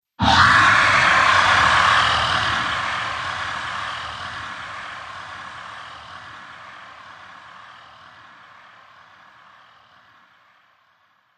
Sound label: Future Dragon Roar, Monster Sound Effects; horror